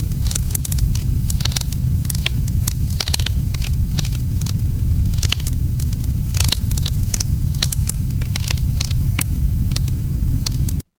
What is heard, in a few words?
ambience fireplace